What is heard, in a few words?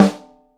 boom snare sample g key